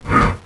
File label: Storm Elemental Move Sound; Heroes3